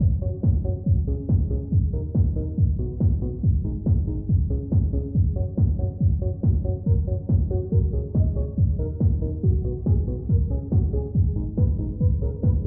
Club Entrance 140bpm 240beats

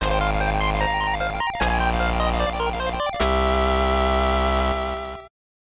VS WIN; After Burst Original Soundtracks

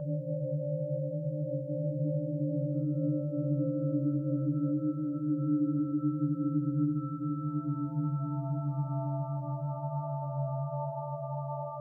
Tense Subtle; Cat Interstellar

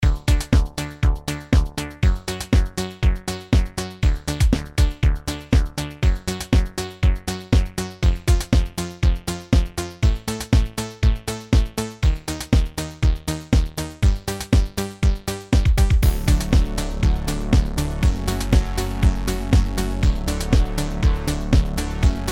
Press Start (8-Bit); the impossible quiz book

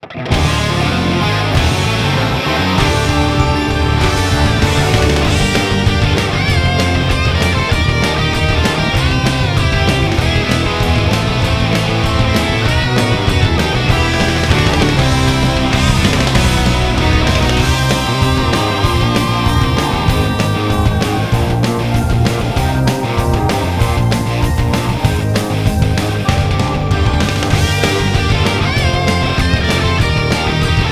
Guitar Ver; baten kaitos eternal wings and the lost ocean; The True Mirror

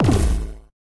Spotlight Appear; Grimrush

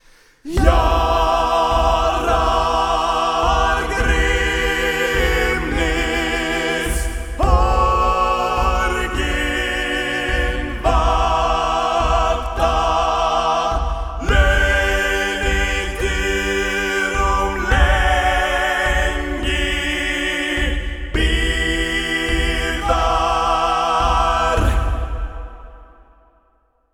Warrior Song

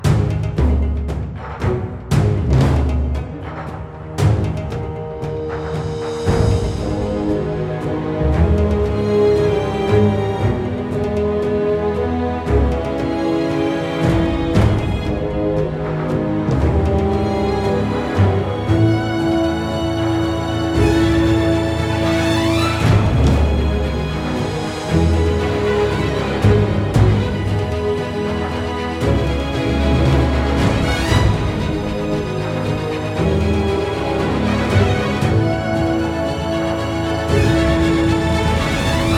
Chase Loop Short

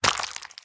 Impact Flesh Med

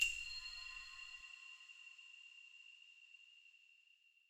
Location Open Small Dungeon Main

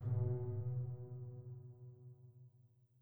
chime; chime sharp

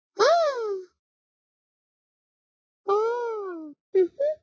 Wardrobe

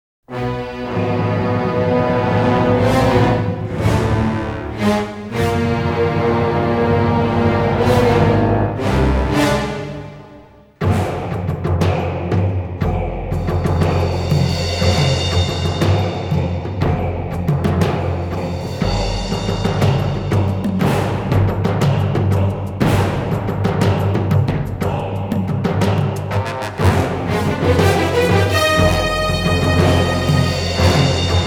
Mountain Battle; Baldur's Gate II Shadows of Amn Bonus Disc

